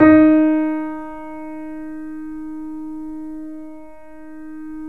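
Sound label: D#6, Bösendorfer, 3D Pianos; E-MU Producer Series Vol. 5; 3-D Audio Collection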